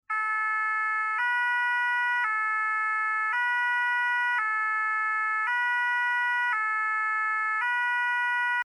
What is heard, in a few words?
Francia Sapeur pompiers; 911 Signal